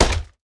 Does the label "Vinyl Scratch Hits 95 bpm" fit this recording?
No